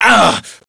pain, player

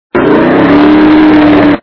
rev Up Sound

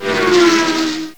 Car Passing; GMPlay

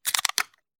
broken Pendant; Keepsake

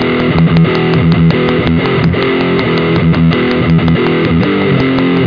Lead Riff; Cool Licks

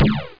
PULSE

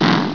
thud

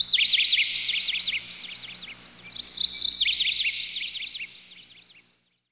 AMBNT 160; Ambient

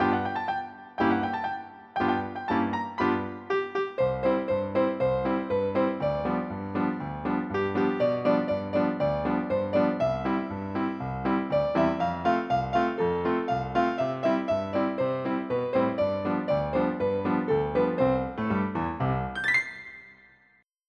jingle; Massive MIDI Collection

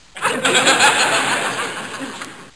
Laughs Only, Small Crowd; Human; Soundsation

